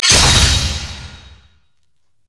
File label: audacious charge; LoL; xinzhao